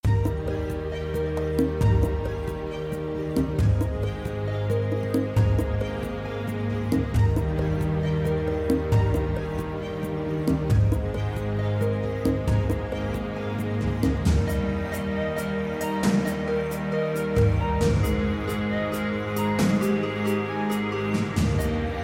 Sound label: wilderness intense dirt, music